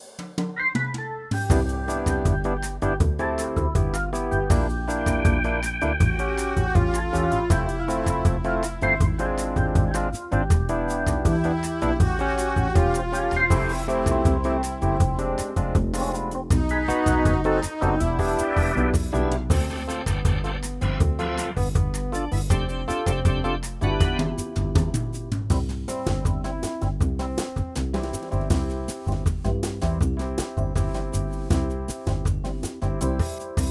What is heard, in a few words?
Organ Bossa